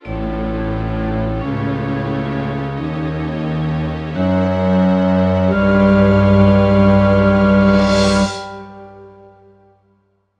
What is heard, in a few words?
Sitar